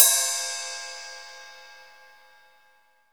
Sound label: RIDE, MONO RIDE CYMBAL